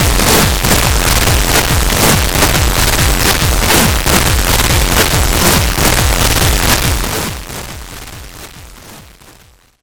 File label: music dpcm; 1bitエセDPCM; 音量・ノイズ注意